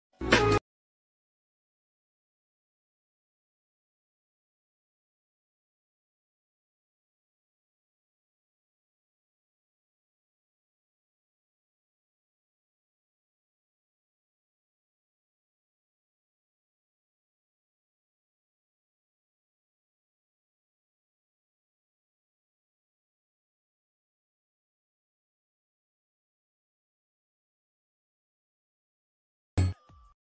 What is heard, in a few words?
om namah shivaya ringtone telugu bgm